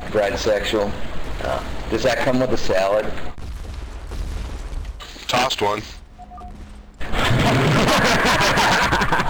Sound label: tom; Snatch